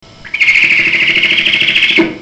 bubo virginianus

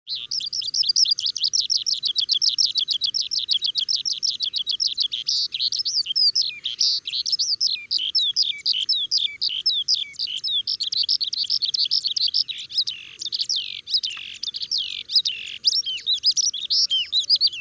alauda gulgula

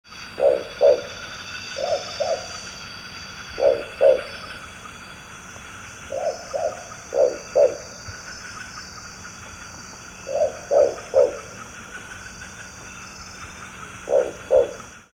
ninox squamipila

